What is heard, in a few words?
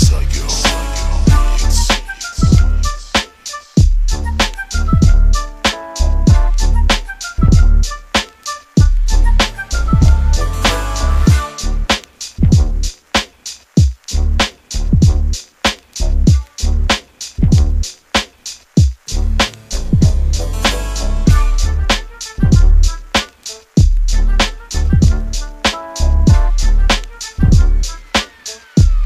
Beats